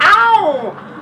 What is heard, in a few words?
owwww